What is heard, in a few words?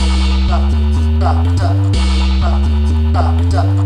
TRAILER BREAK 124 bpm; POTBreaks